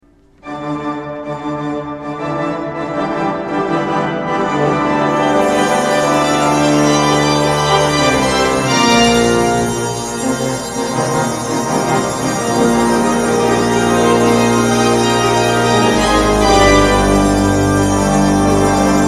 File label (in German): Improvisation